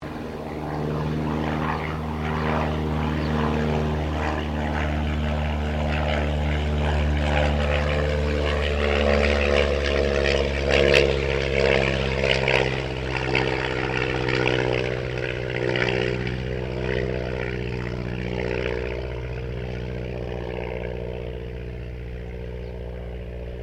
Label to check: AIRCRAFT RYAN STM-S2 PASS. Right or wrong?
Right